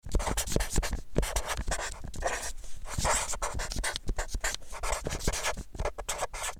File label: Permanent marker writing paper surface long